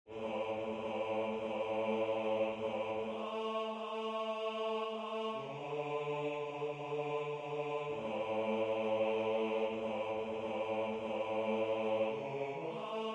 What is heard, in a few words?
Bass